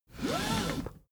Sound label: car seatbelt pull